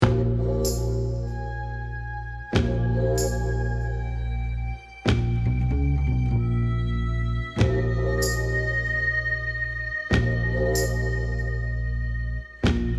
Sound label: Pluck; laika aged through blood windows gamerip 2023